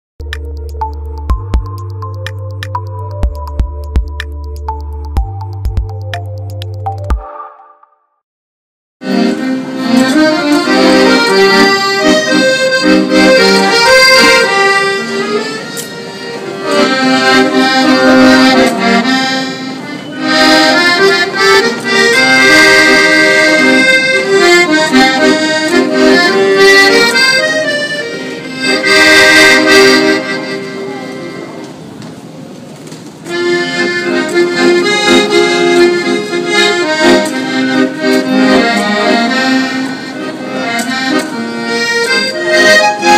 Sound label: accordion